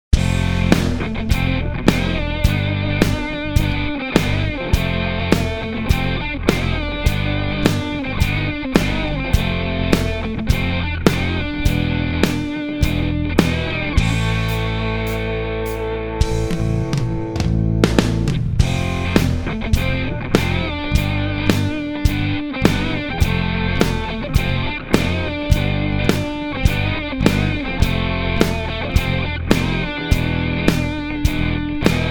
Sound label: somebody drums; plexi50